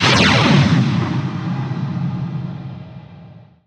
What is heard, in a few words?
silver edge target; dota